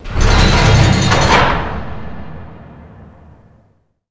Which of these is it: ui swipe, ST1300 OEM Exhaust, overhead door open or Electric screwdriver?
overhead door open